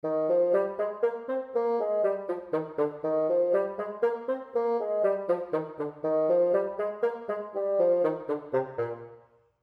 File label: Bass Clef Example